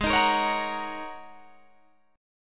G sharp 7th Suspended 2nd Suspended 4th G sharp Guitar Drop D